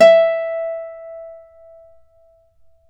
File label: Celtic Harp, Plucked Strings